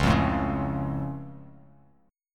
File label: Db Augmented, down; strummed; JGuitar